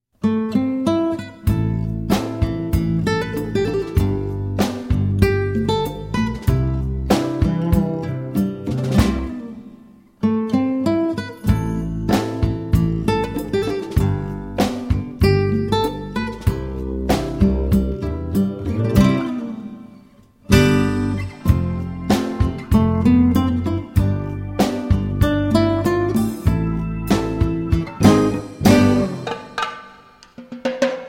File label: Ballad